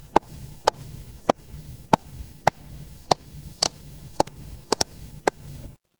footsteps heels

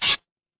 cartoon squeak

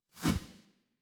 transition swoosh

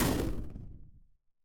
retro game explosion

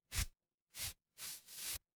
paper fabric fabric

